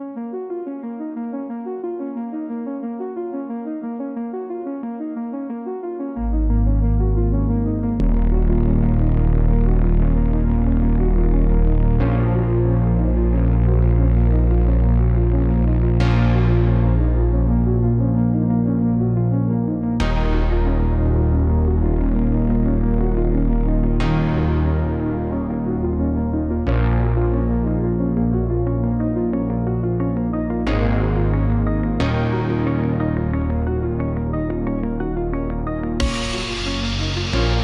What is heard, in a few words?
a slow and haunting electronic soundtrack in the retrowave genre using 1980s synthesizers